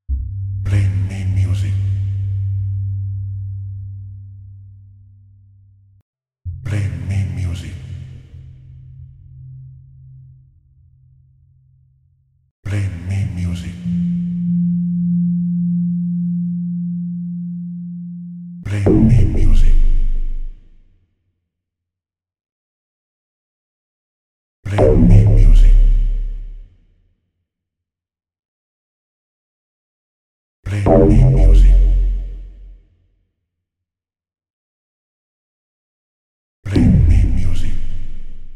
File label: SFX BASS